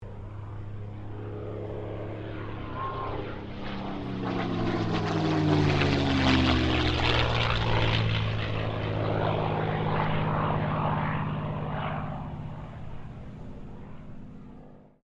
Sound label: AIRCRAFT CA-18 MUSTANG PASS; P51 /CAC-18 Mustang; Airplanes